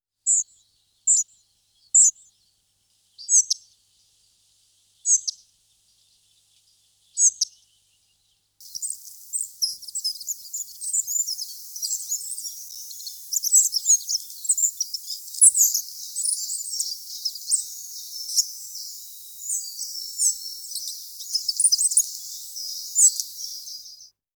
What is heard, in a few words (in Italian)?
pigliamosche; usignolo